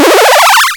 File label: power up; efeito sonoro